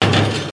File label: landing; Alien